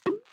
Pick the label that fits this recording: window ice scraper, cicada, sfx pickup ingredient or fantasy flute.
sfx pickup ingredient